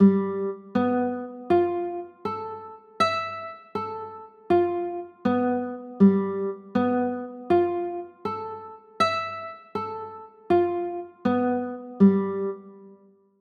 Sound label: g 13, chords